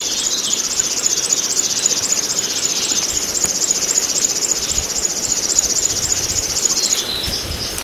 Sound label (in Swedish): Flodsangare